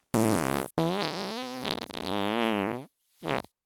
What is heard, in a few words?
fart; vegan